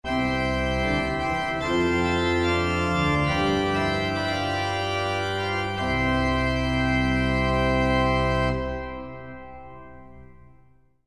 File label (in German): mixtur, orgel